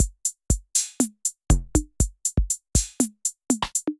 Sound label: 808 Kit; Drum Machines; 808 Medussa Kit; Ableton; Factory Packs; Drum Essentials